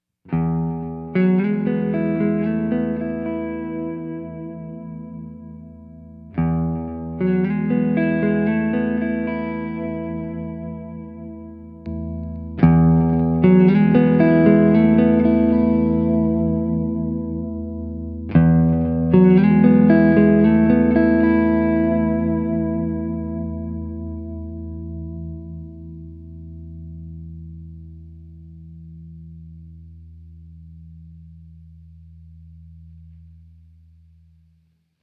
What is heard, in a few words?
classic s neck